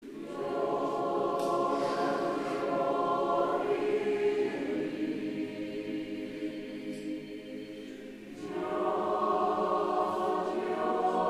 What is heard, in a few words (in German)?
Andachtsjodler